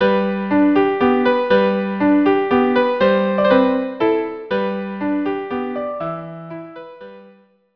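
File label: waltz violin viola duo; Brahms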